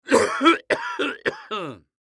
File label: Human Cough; Cough Multiple Male Old; Sick; Blastwave